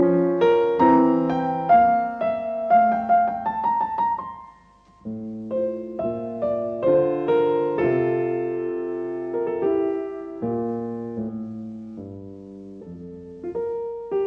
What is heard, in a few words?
Piano Sonata in C major Andante un poco adagio; Lili Kraus